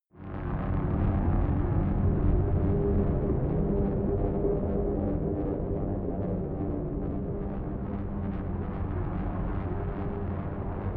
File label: electrostatic